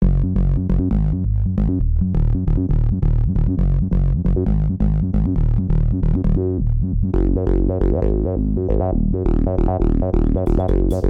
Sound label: microKORG REAKTOR5